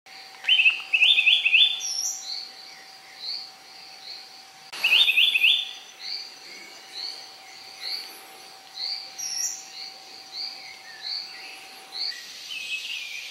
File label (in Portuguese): Canto do soldadinho do araripe